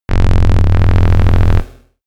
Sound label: drone clicking bass rustler short